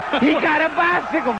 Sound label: Rhodes